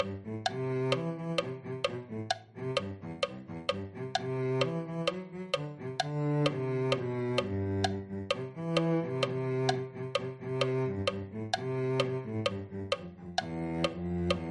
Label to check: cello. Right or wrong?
Right